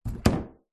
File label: household door internal close med hard light room reverb; zapsplat